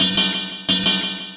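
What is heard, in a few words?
ride, perc loops, 175, drum and bass; EZRollers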